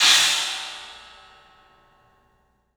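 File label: CLUB CYMBALS; 3D Sound; 3D Drums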